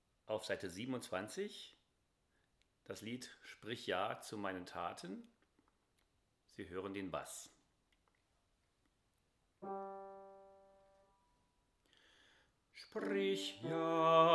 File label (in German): Sprich ja Bass